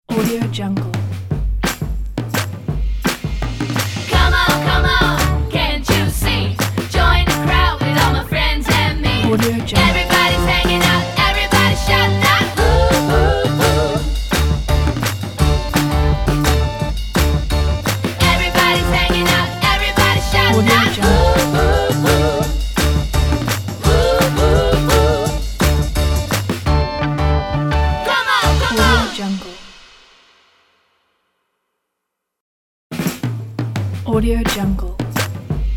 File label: kids commercial with vocal